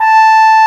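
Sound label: FMA French horn; AKAI S6000 CD-ROM - Volume 1; BrassWind; WIND&HORN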